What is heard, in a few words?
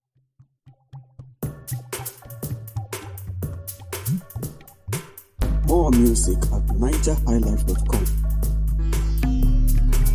Sitar Mode